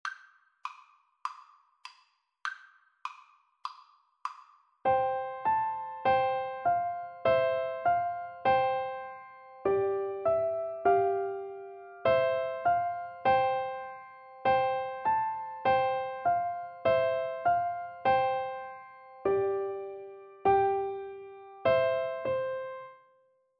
london bridge, Piano Duet

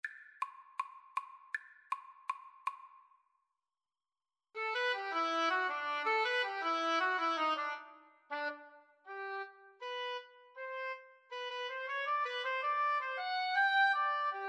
the entertainer, Oboe Duet

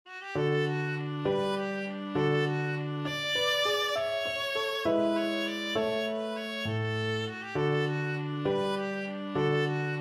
Gigue G Major, viola

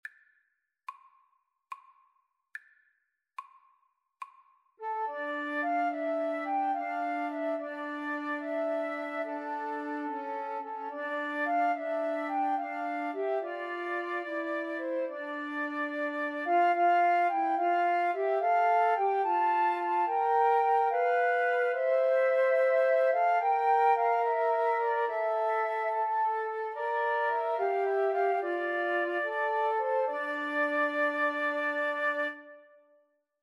gabriels message, Flute Trio

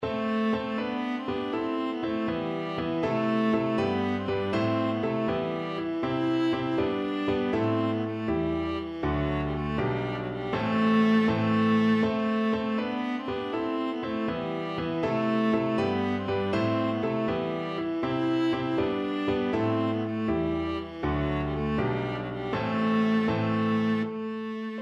plucks, viola

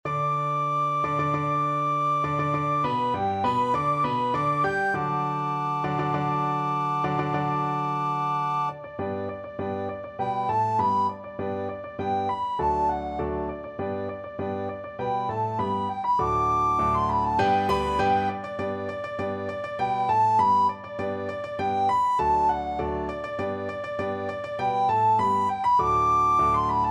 rossini william tell, piano duet

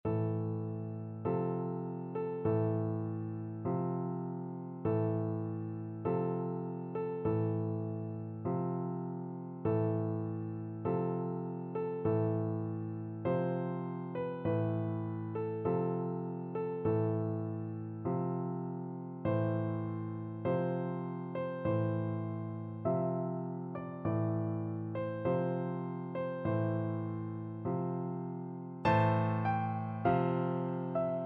chopin funeral march, piano duet

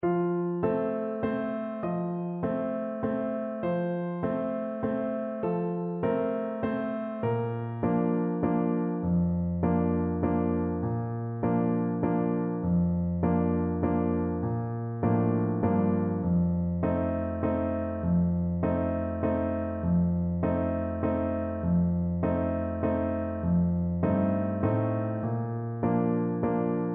down in the valley, clarinet

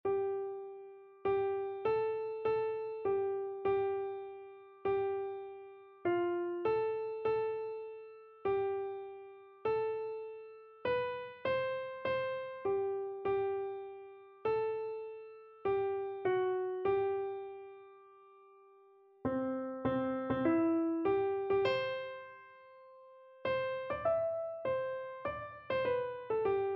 up from the grave, keyboard

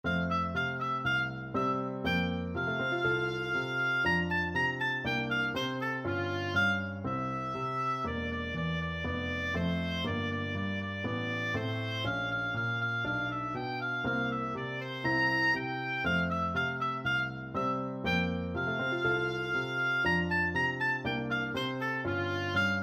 reed pipes, oboe

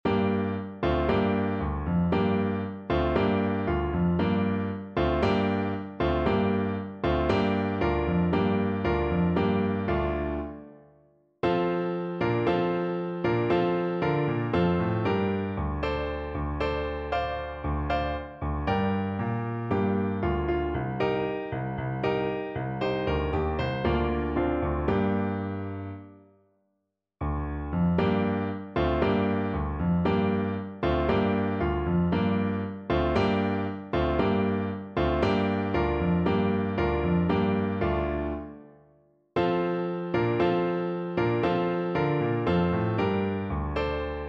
horn, French Horn

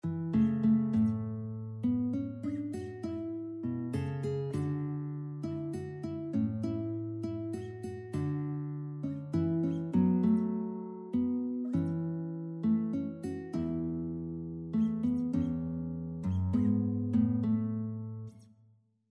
guitar

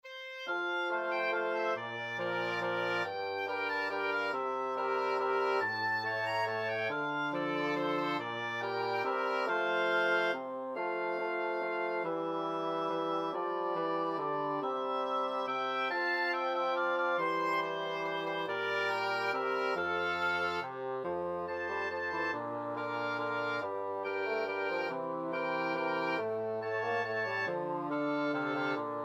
we wish you a merry christmas, wind quartet

